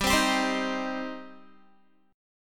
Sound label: G Suspended 4th Sharp 5th G down Guitar; Gsus4#5 strummed